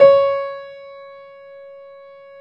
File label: PIA GRS, PIA_GRAND, Roland